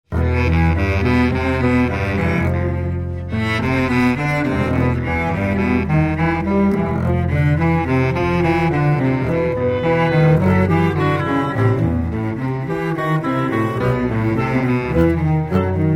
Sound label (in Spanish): Un Tango